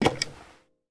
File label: Clock Drop; MiSide